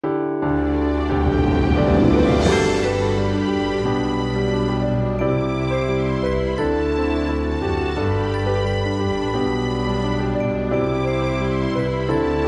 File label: background